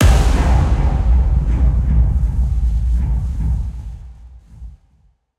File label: Ruin, Effective Trailer Hits & Impacts; Federico Soler Fernández